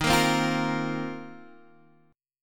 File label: Bb Suspended 2nd Suspended 4th Eb